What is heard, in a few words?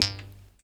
KHOMUS M, KHOMUS NT; USB Soundscan vol.60; Asian Traditions; AKAI